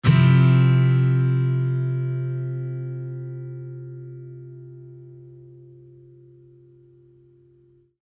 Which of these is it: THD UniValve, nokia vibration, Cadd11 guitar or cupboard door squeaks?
Cadd11 guitar